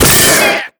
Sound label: pain, headcrab black; creatures; vox